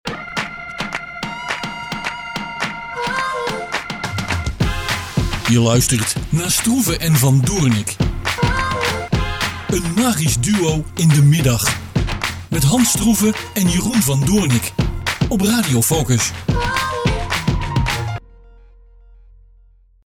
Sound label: Jingle